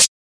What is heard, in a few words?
Old School Tamed Pedal Hi-Hat Single Hit G#; G# Key